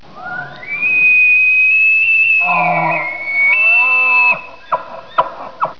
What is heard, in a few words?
elk